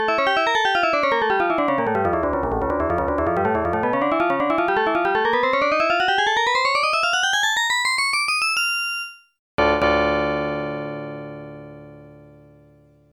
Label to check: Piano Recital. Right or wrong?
Right